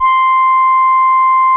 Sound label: LAMBDA, String Machines